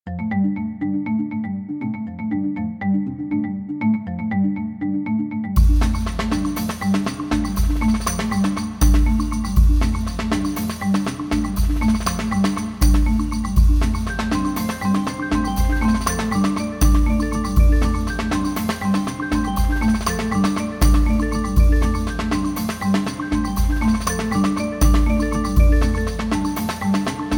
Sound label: perc march like glass